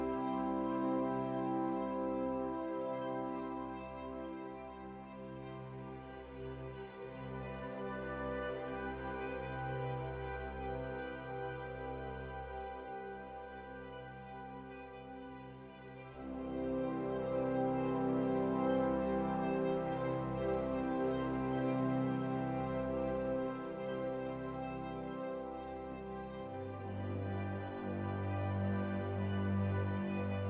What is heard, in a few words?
Loop; Europe1850